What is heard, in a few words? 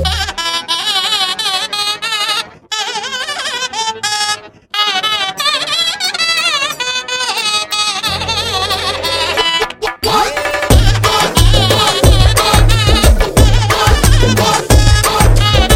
Music Ringtone; Telugu